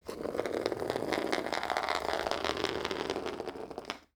Battery rolling from right to left on wooden surface; Sound Ex Machina; Rolling Objects